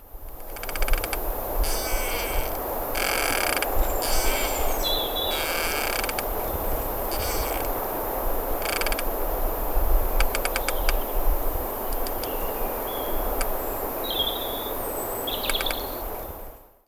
Creaking Silver Birch

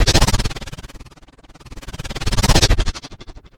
Panning Fx

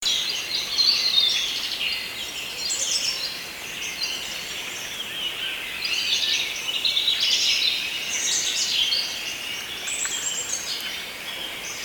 Forest In The Evening

